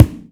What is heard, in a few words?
KICK; akai; mpc1000 factory preset samples